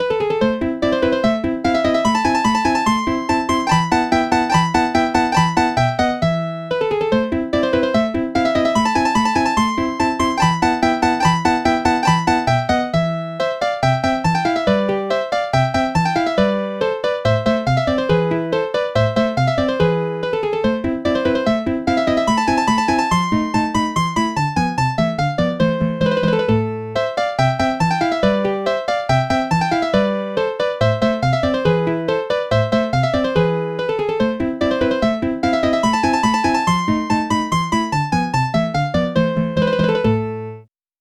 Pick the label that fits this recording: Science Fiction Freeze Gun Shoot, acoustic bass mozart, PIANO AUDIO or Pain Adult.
acoustic bass mozart